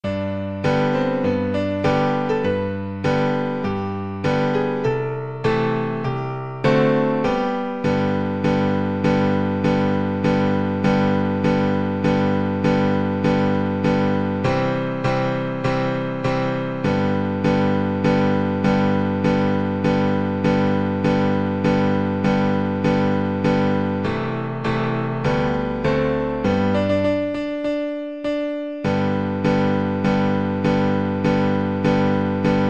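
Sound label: hymn VLC karaoke, Cello; battle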